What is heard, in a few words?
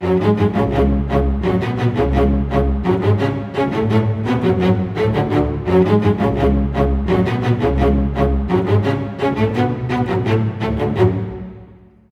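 F# minor, Low Strings, 85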